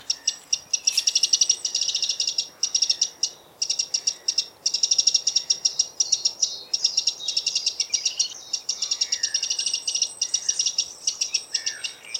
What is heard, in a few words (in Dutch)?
winterkoning alarmroep